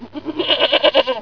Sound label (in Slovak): Koza, Zvierata; Revelation Sight and Sound SK